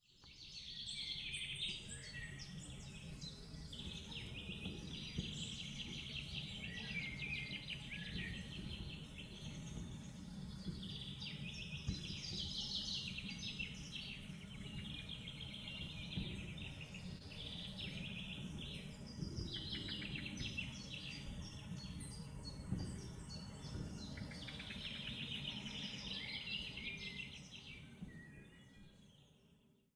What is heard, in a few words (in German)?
Voegel im Bergischen